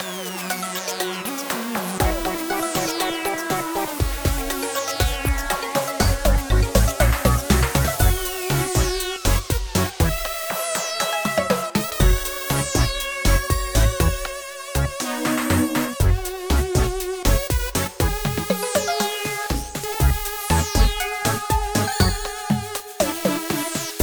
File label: Grunt Theme; Altair